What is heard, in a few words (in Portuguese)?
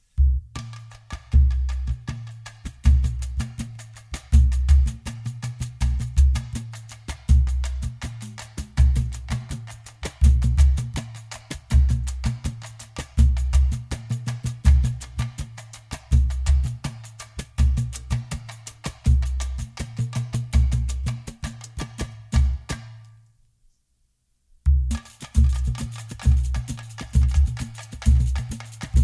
Bateria Escola de Samba